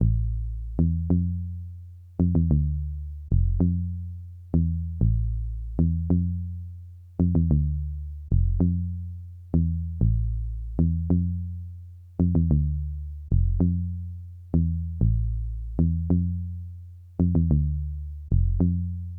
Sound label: 96 BPM A Min Bass; Melody; Loop Stems; Cymatics; Infinity Melody Collection (Preview); Rooftops